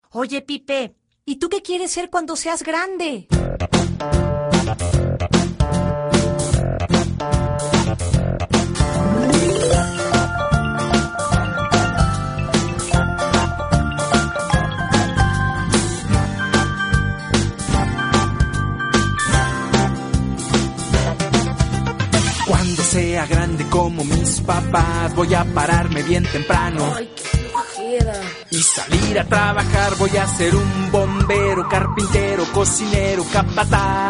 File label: pipe